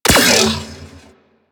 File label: Verglas Shot, weapons; DE; Warframe; Sentinel